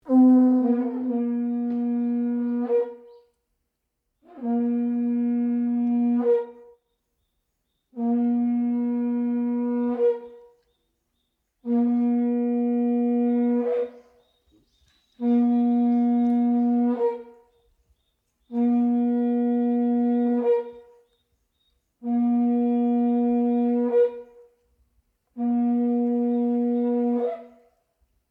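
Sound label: japan trumpet shell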